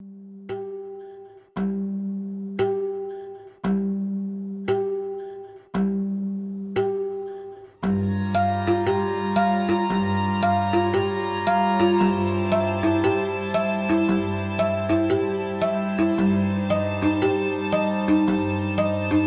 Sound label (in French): Les octaves brisées